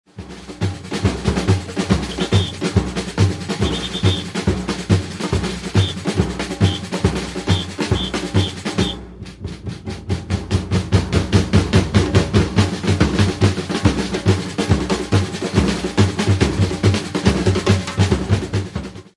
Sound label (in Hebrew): Break up, מעבר 4 גבוה; Batucada; סמבה